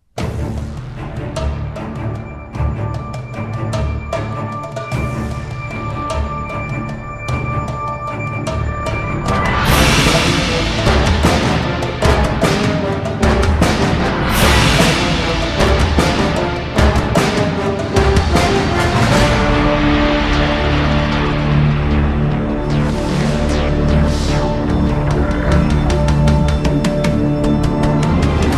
Remix Version, Without Choir

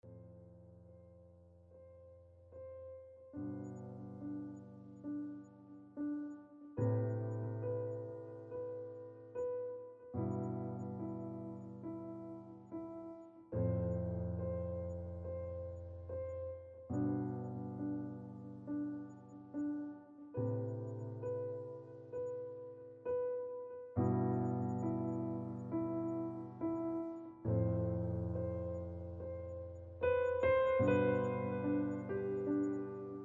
Piano Version

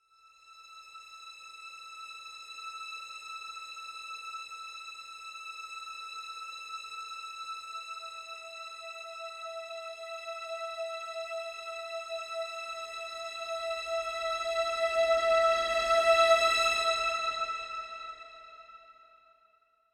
Simple String; Brand X Music; Brand X Music Catalogue - Editor's Essentials

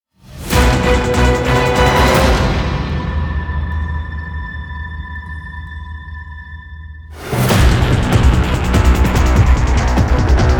Traffic Jam

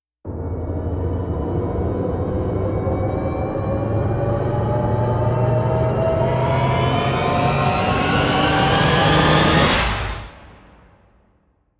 Radiant Rise; Ninja Tracks

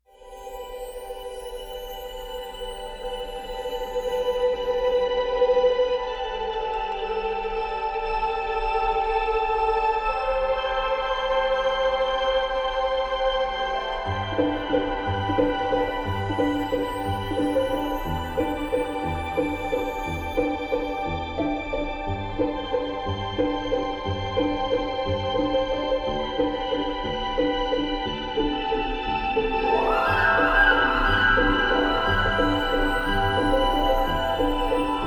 Magic Ambient

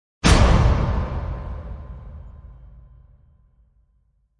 Hell Drag Slam; Gothic Storm Music

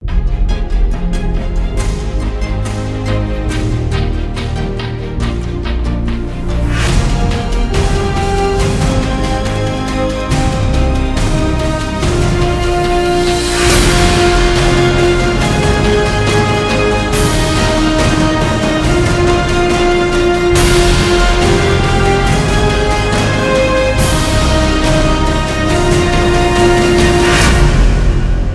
No Choir

